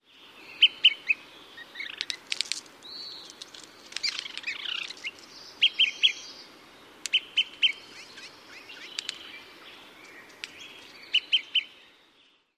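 Olive-sided Flycatcher, mobbing calls with bill snaps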